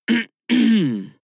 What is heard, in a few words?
harrumph male; koerpergeraeusche; menschen; soundpool